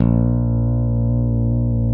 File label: STICK, GUITAR FX